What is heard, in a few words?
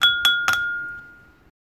Clinking Glasses